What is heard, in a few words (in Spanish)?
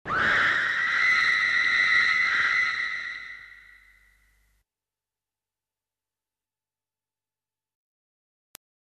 chillido de mujer